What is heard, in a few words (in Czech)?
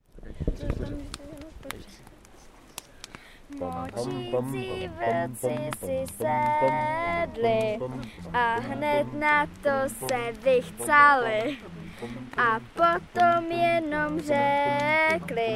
mocici vlci